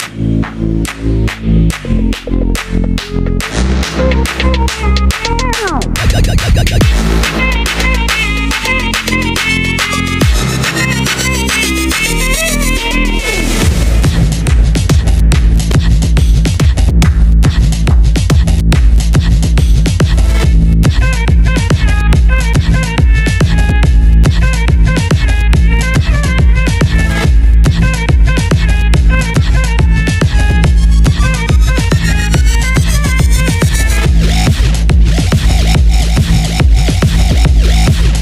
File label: DJ Mix; Music Shop Murthy